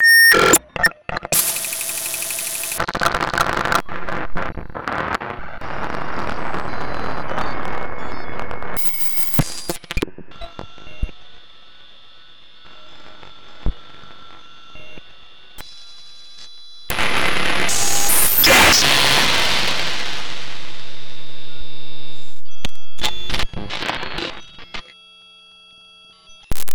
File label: noise